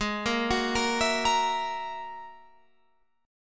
Ab 7th Suspended 2nd Sharp 5th down Guitar Open F; Ab7sus2#5 arpeggiated

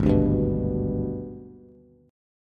Ab Minor Major 7th Flat 5th chord, Bass; strummed; 4,5,5,4; JGuitar

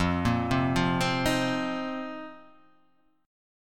F 7th Suspended 4th F; arpeggiated; chord; F7sus4; x,x,3,3,0,0,2,0; JGuitar

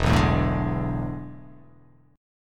E 11th; strummed; JGuitar

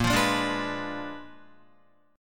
A sharp 7th Flat 9th, Guitar, A#7b9 chord; strummed; JGuitar

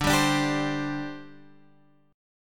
D 7th Suspended 4th, down, Guitar, Half Step Down

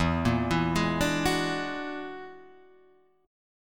E 9th Flat 5th E down

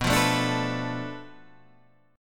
B Minor 7th Flat 5th B Guitar Half Step Down; Bm7b5

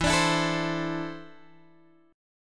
E 7th Suspended 4th Sharp 5th, Guitar, Open G

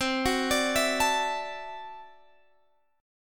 D 9th C down Guitar Standard; arpeggiated; JGuitar

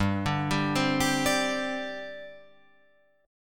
G Suspended 4th G down Guitar Open F; Gsus4 arpeggiated

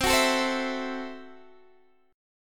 C 6th Flat 5th C down Guitar DADGAD

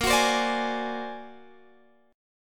A sharp Augmented 9th A sharp Guitar Open G

